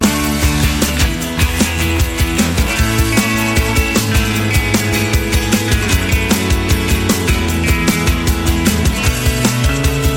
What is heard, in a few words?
instrumental